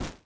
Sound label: step snow